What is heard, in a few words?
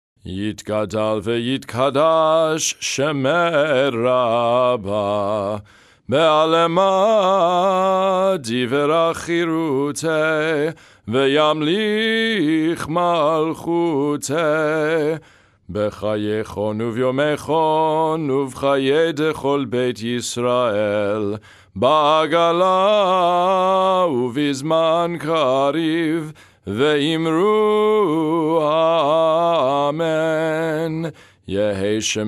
hatzi kaddish festival ma ariv melody